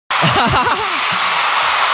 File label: laugh